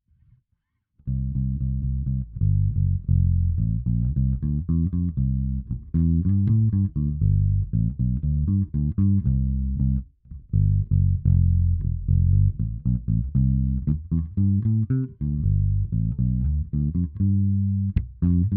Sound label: Bass